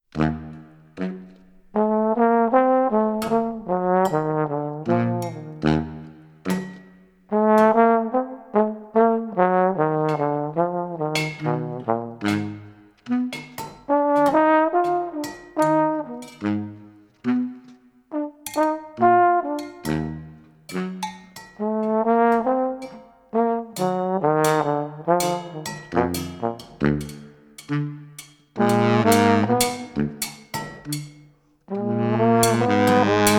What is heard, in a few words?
BRASS BAND La Valse